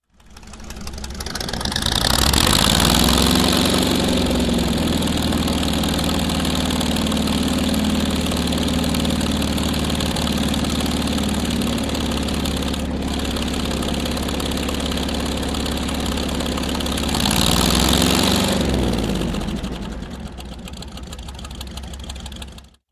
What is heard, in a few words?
Military Vehicle Engi